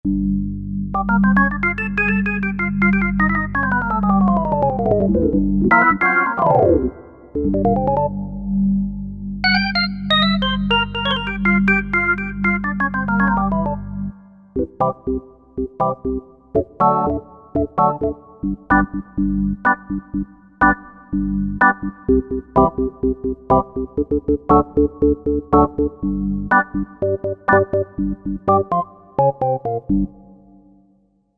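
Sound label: Analog + Percussion, Korg MSC-9S Organ; Analg+Perc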